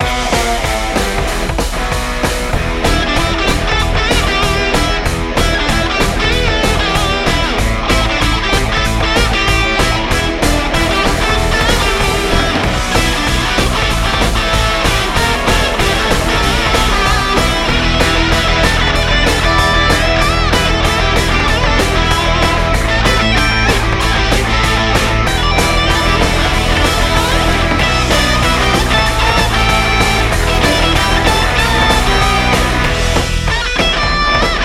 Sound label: Vintage Tubes; An energetic, groovy rock track is perfect for intros, action videos or fast paced games.